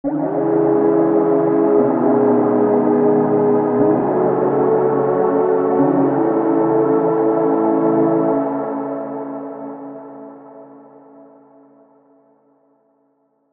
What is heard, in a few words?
serum pads; chiqui audio